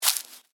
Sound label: Footstep: Individual Footstep On Dry Leaves; Footsteps; Human; Stuart Duffield